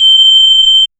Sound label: a ding at the end; alerts